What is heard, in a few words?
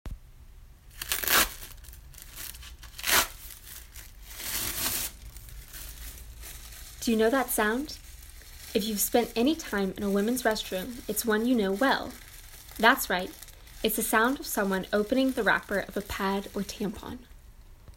Tampon noises